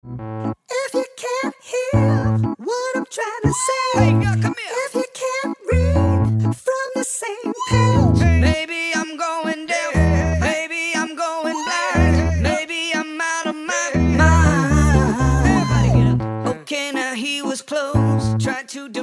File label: no drums in A